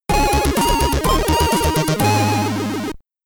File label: End of Bonus Round (SID Stereo); pp hammer; commodore 64